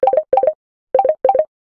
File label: Samsung Calling You